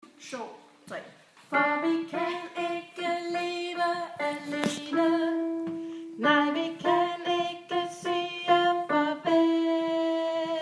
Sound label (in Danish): omkvæd alt